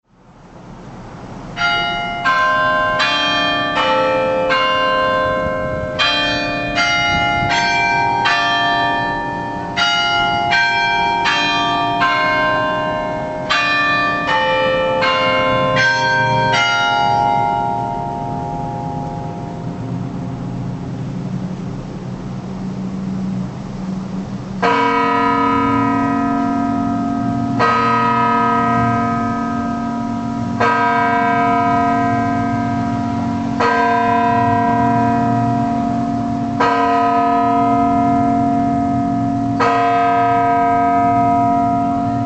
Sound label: clockstrike, carillon